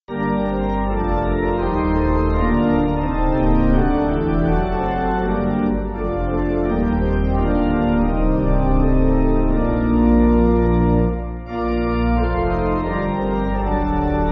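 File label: Pipe, Music Backing Files for Churches - Pipe Organ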